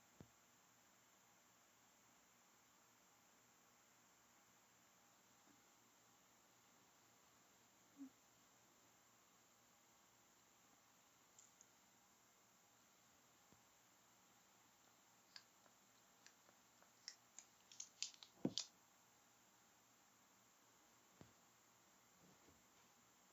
Femsub painslut improv [sfx] [no narative] [gasps] [moaning] [breathy]